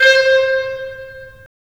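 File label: WND CL, wind clarinet; LA Composer vol. 3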